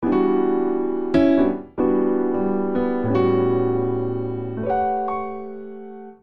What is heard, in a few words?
Vamp Jazzy Block Chords Sparse ii - V D Major 77 bpm, Grand Piano; MIDI Clips; Ableton; Factory Packs